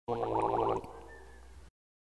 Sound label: Gargle